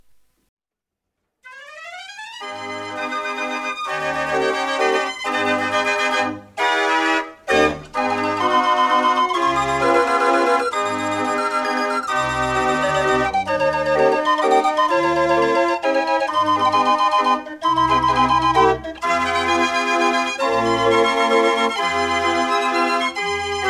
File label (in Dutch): draaiorgel; de sik